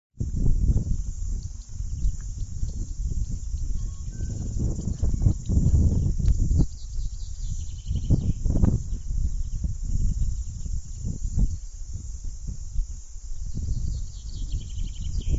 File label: stream bend putangirua pinnacles